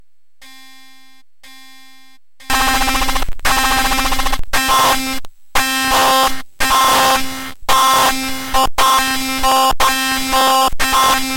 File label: keyboard; audibleDisease